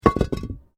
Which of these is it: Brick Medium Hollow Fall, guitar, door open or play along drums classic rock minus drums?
Brick Medium Hollow Fall